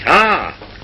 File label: "ah", male surprise; Voice; ooh ahh